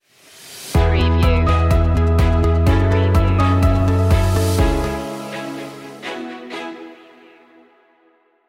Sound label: steady progress stinger